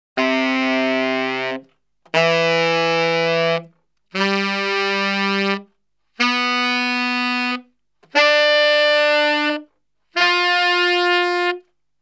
Salsa Picante; Masterbits; World Zone VOL-3

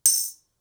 Beat Ring, drums singles; The Roots Drumloops

